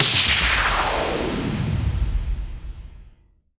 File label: White Down; Jungle Warfare 1&2